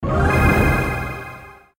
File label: level Up